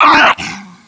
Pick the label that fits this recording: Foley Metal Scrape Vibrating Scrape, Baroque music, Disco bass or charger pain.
charger pain